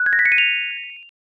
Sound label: rainbow